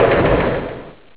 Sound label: crash